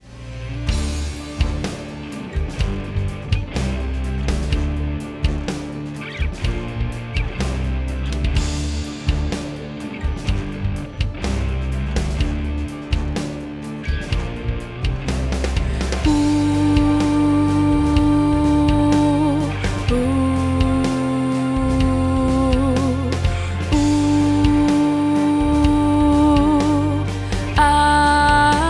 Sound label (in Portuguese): soprano